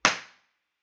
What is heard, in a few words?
Impulse Response